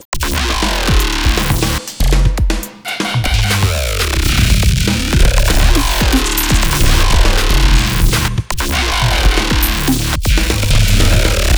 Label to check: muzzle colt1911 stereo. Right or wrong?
Wrong